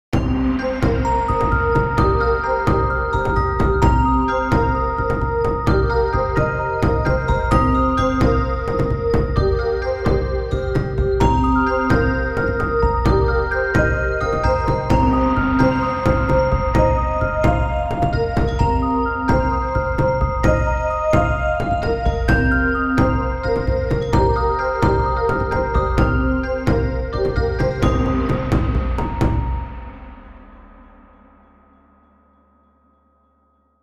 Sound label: Eastern Sus; 신비